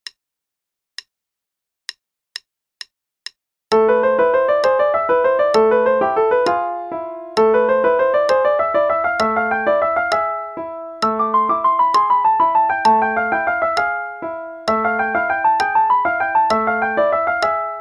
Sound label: Rippling Waters (qn=132); Play-along